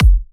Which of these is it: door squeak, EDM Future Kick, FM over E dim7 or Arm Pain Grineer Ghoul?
EDM Future Kick